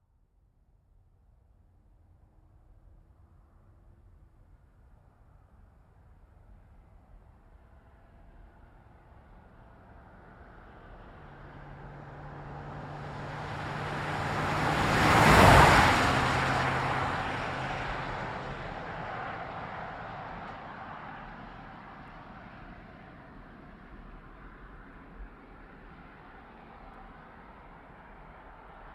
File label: Truck GMC Savana By Fast; 2010 GMC Savana; Trucks; Vehicles